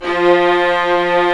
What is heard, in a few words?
String Vlas Sect Agitato; Vas1 Agitato